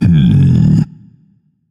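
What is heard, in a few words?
non-verbal thinking; smite; atlas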